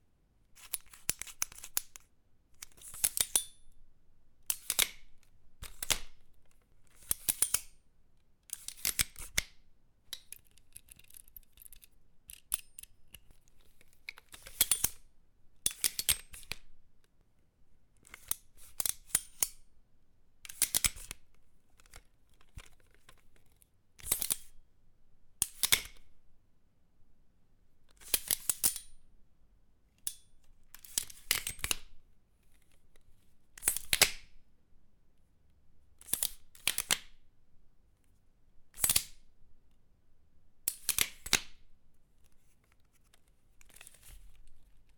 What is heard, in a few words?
loading gun